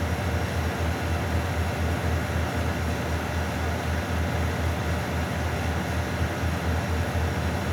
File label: interior, ambient; nuke